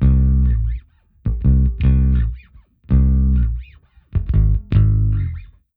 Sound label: NeoSoul Bass C; R&B Funk Soul; Digidesign; Pro Tools; Pro Tools Essential Demos; Essential Loop Demos; Demo Session